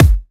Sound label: kick soft